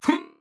grunt jump; paladins; DarkLord

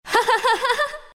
laugh, zh; dota2

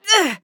Grunt Hit; Imani; Paladins

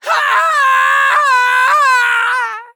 Falling; Mech

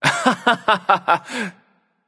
laugh; Neon Rebellion Rama; Smite

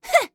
Grunt Fast; Mage; Starburst Sorceress; Realm Royale

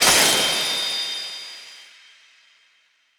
effect ice; feheroes

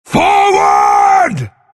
battlecry; Lone Druid

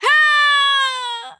Falling; Paladins; Huntress

